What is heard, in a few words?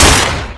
elite fire; weapons